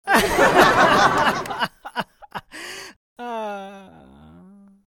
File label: TV Broadcast (Laugh Track)